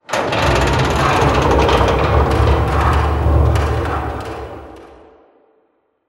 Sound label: ambient industrial metal ticks